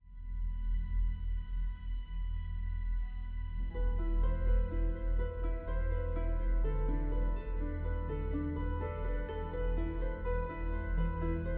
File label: Instrumental Short; Baldur's Gate 3